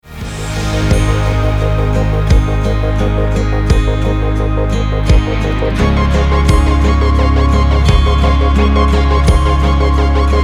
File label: instrumental